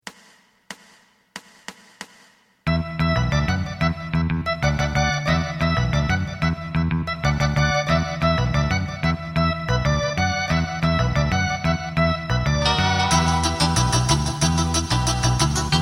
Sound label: drum